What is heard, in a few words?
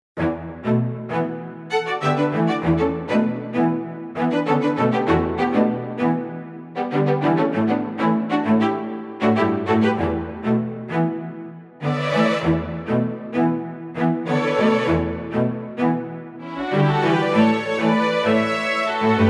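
dry with send reverb; mir pro 3d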